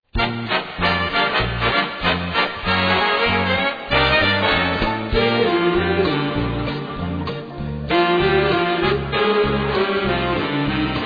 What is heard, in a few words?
49 bpm